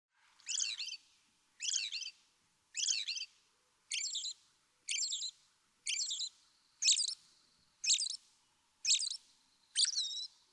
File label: American Robin